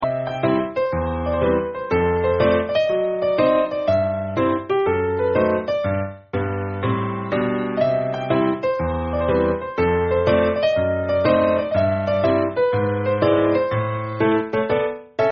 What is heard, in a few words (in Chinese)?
游戏内音乐; ArrangeTheShelves